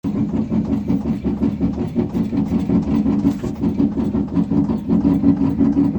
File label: metal thunking sound bed